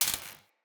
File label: Shield Orb Zap